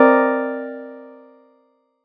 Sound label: SY LUSHLY; ModelCycles